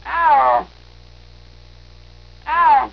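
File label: snow leopard voice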